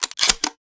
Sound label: shell insert, 870; weapons; Urban-Coalition; Decay; arccw ud